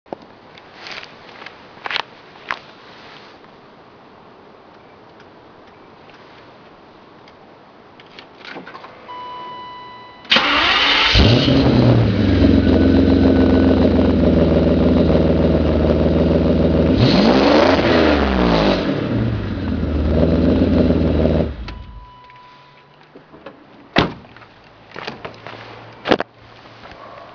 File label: crank